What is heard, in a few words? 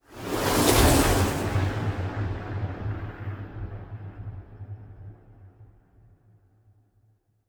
ship battleship move; Stellaris; machineage